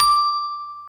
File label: N Bell D6